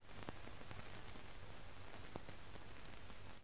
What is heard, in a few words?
Windows Startup; Windows Never Released Soundpack 2; deviantart